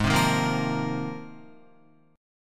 Ab 6th Add 9th Ab, Guitar; strummed; JGuitar

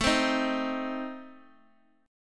A Diminished, down

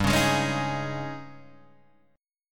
G sharp Major 7th Suspended 4th, G

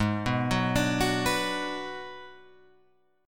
Ab Minor 7th Flat 5th Ab, down, Guitar, Open F; Abm7b5; arpeggiated

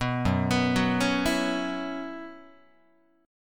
F sharp 7th Suspended 4th, Guitar; arpeggiated; F#7sus4; 2,2,2,4,5,3; 4A,4E,5A,5D,5G#,6C#

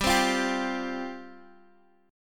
F Suspended 2nd G

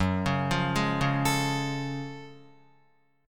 Gb Minor Major 9th, Guitar; arpeggiated; 1,4,5,1,1,5; 4F,4A,5C,5G,5C,6E

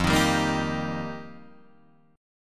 F 7th Suspended 2nd Suspended 4th, Guitar Drop D

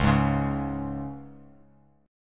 Db 5th Db down; Db5 strummed; JGuitar

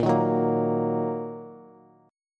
Bb Suspended 4th Sharp 5th Bb down Bass; strummed

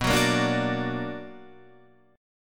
B Minor Major 7th, BmM7 chord; strummed; JGuitar

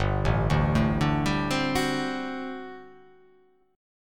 G Major 7th Suspended 2nd Suspended 4th G down

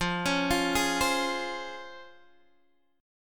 C sharp Major 7th

